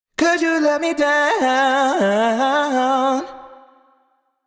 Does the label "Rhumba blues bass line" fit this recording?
No